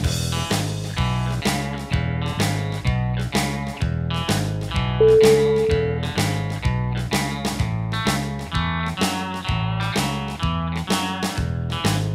chicken shack blues

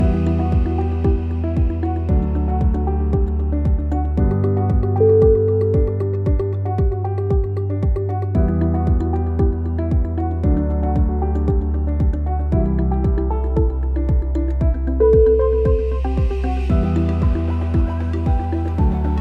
ambient clouds

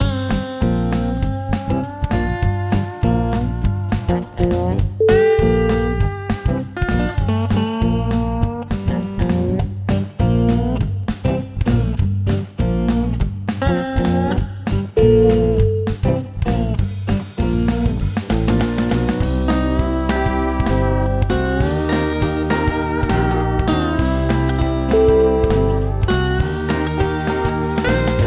blues

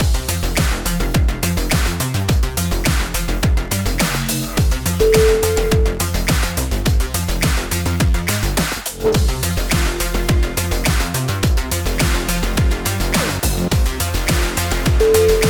sport electro

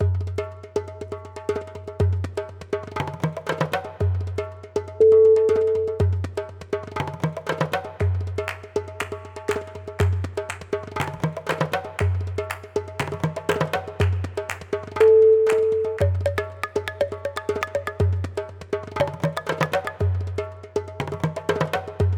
tribal drumming

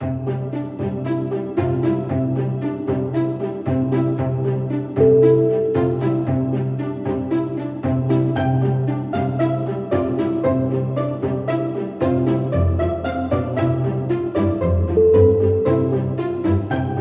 deck the halls classic

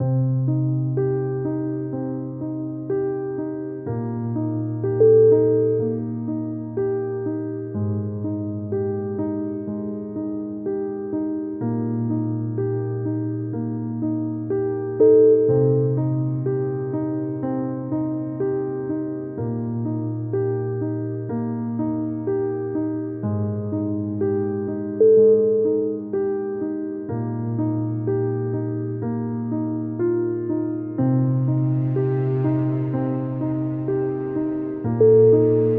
slow sadness

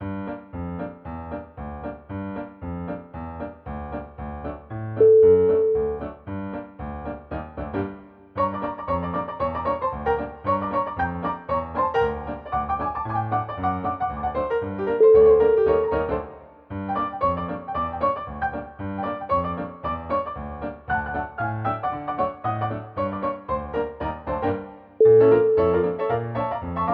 breaking news rag

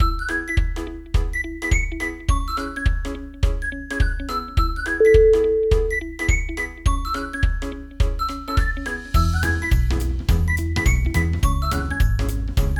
in happy mood

